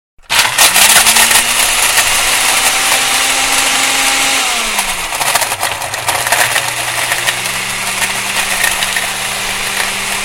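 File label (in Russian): звук блендера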